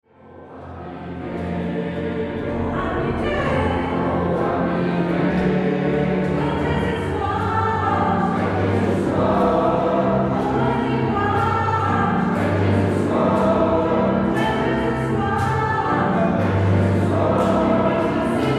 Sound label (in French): oh échantillon